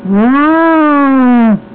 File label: cow